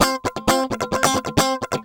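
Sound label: guitar, 130 BPM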